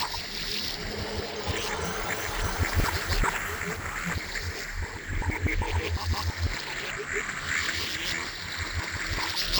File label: Industrial Winter, Soundscapes; Ambient Cinematic